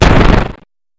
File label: slide